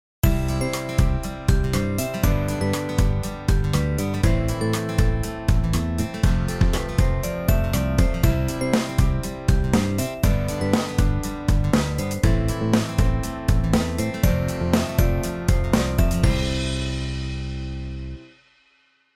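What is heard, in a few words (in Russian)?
интро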